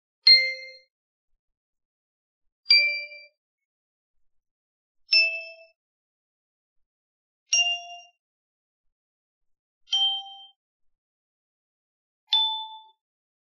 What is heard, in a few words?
Candy Music Tiles CDEFGAB